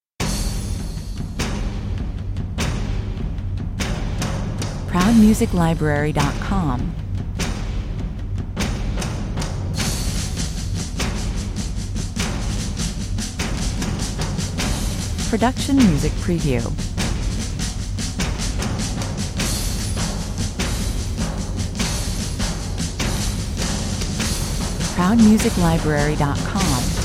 percussion only

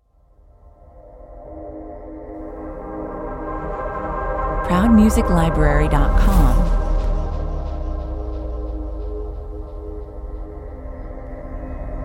tension and rise opener rev suction 134 bpm

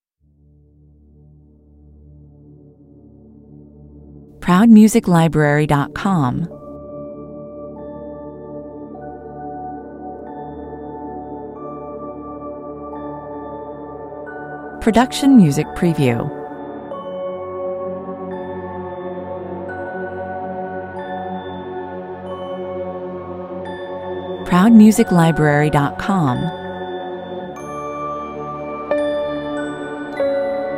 evil music box

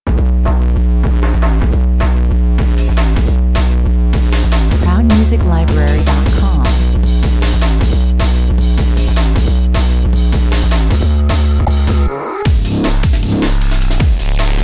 bass tricks (no vocals)

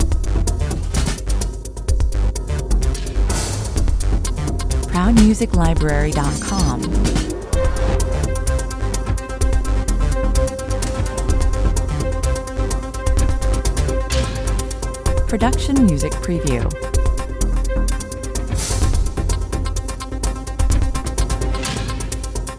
corporate kit movie music 128 bpm

